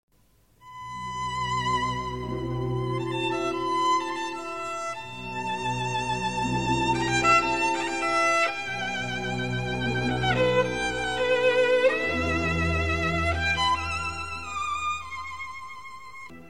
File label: Very Sad Violine